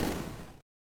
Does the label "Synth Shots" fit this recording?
No